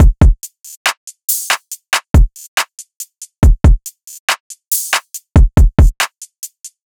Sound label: Full Drum Loop 140 BPM; Cymatics - Astro Butterfly